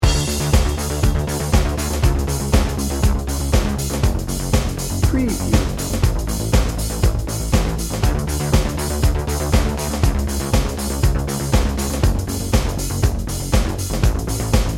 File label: bold spirit dnb